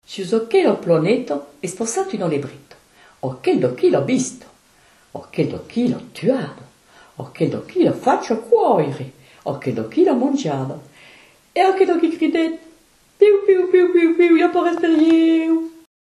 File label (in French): Comptine occitane